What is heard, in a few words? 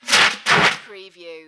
Fire Extinguisher Twice